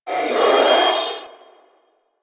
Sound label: Sentient Symbilyst Pain; Enemies; Barks